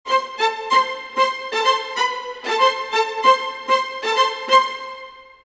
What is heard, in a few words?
95 strings theme hi, Orchestral arrangements, 95 A min